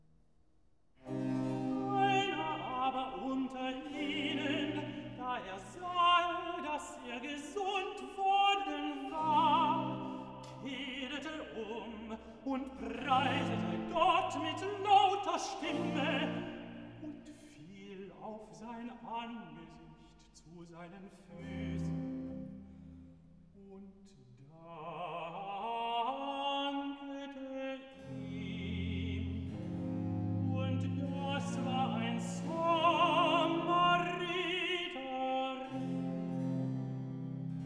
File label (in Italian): Cantata, Recitativo (Tenor); BWV 17 'Wer Dank opfert, der preiset mich'; Bach; Ambronay; 14th Sun After Trinity